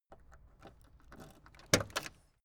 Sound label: car camaro unlock trunklid